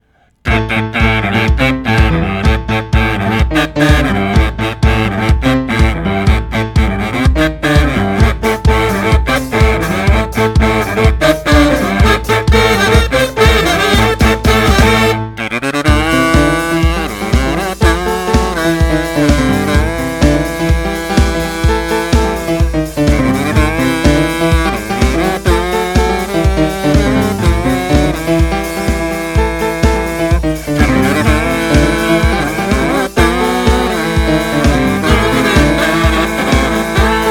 Piano, Kazoo, Cover; Jojo's Bizarre Adventure

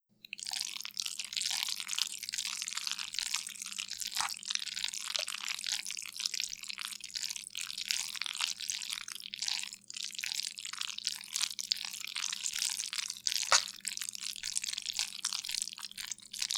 slimy pasta stir; Lewd SFX

